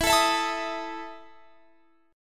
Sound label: E Major 7th Suspended 4th E down